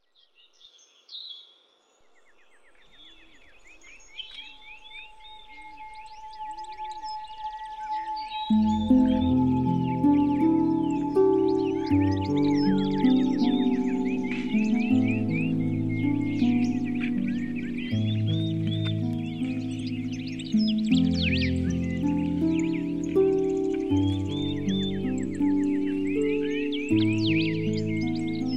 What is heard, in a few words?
Music With Nature